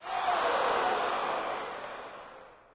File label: victory crowd; Smite; Cabrakan